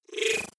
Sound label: disappear flicker short, signale; soundpool